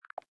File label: airbutton close, ui; Galaxy S9 Ringtones